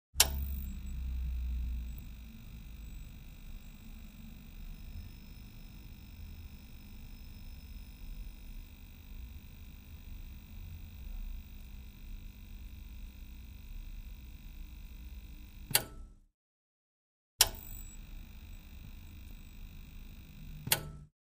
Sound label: Tv Self Buzz On Off, buzz & hums